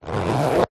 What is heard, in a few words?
pouch small zipper zip; zapsplat; foley